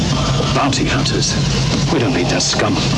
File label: pain; quake2; bobafett